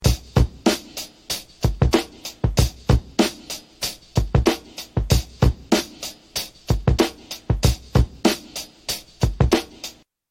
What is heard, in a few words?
Drums